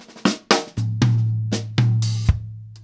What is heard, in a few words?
Ballad Fill, Drum, 70's Ballad; Sonic Foundry (Sony Creative Software); Drum Tools; Laidback